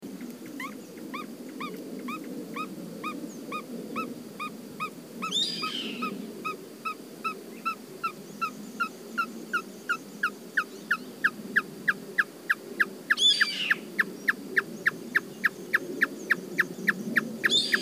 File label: Marsh tapaculo